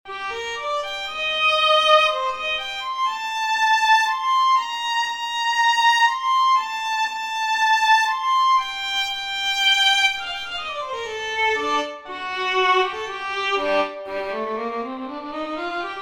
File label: violin solo